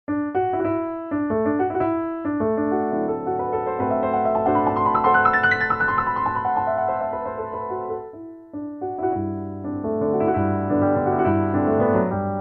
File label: Yamaha P525 Boesendorfer